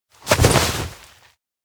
shake off arm; anemoi

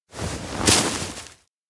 pull grasswhistle snow; anemoi

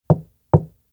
knock wall; anemoi

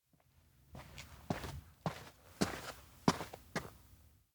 steps outdoor observatory walk; anemoi

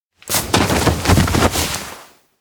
lift up; rikka; anemoi パッケージ版

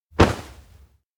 grab shoulder; awayuki

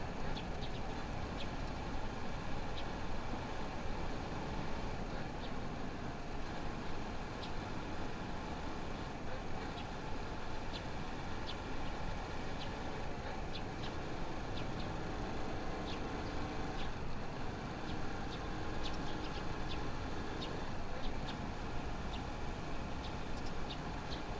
outdoor bike run; anemoi

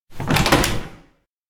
door abandoned cafe open fast; anemoi

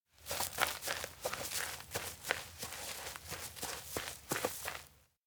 steps grassland approach; anemoi

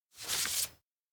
paper pick; anemoi

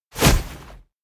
elec run bashu; chiharu; anemoi

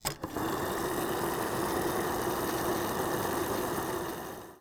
put gas; anemoi

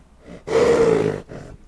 Horse; anemoi

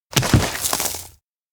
stumble; koyomi; anemoi

